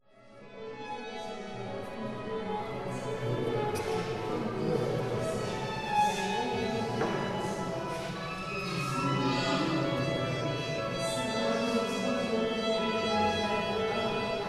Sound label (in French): CONCERT DE VIOLON; MONTRICOUX